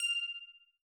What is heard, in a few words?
Button Confirm